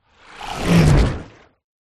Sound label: fantasy magic spell dark wet watery whoosh